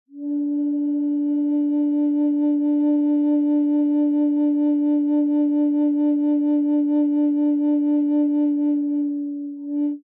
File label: Flutes Single Note, Bass D